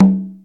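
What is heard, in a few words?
Conga 3, dry perc, Quasimidi Rave-o-lution 309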